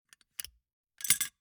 Gumball machine, candy door, plastic, open, drop, hyper; Eiravaein Works; Vaeyan IV